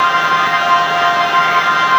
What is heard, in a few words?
smokebomb loop; LoL; akali